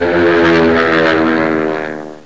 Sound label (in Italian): jeep, veicoli; Effetti sonori